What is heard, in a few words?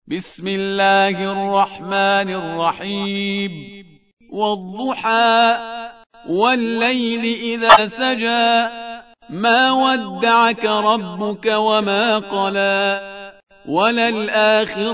zuha surah